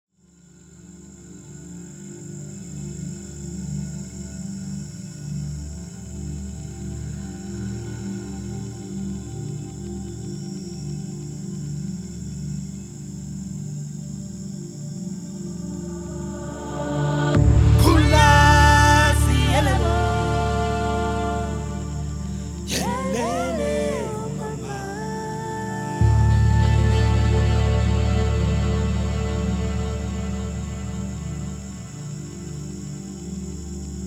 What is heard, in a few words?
Youth Choir